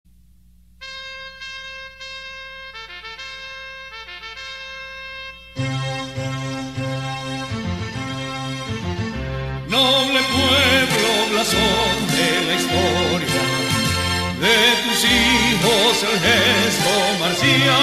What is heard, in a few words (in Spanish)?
himno de pamplona